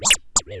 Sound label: Robot Oneshot; BedRoom